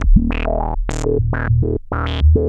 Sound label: HVCF D#1; CMP - Analogon; SH-VCF